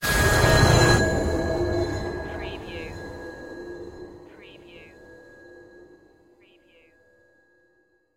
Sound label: sudden extraterrestrial appearance